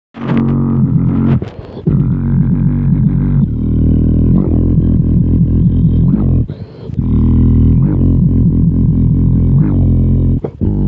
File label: inward sub bass